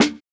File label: Snare, jungle breaks drum sounds